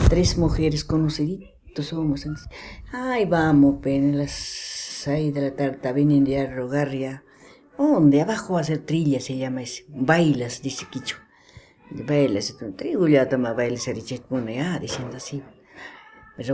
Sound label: Potato Song; Huangascar